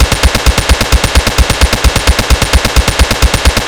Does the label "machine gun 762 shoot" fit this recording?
Yes